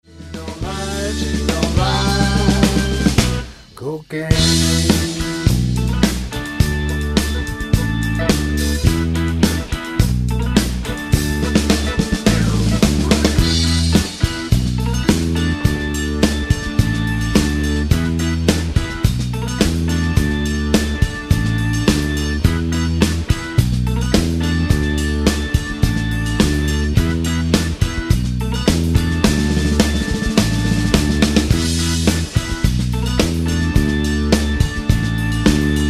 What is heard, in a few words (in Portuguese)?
backing track SEM GUITARRA SOLO NORMAL